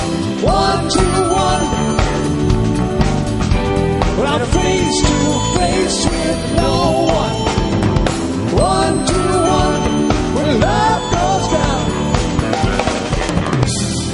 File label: Piano Solo Drum Solo live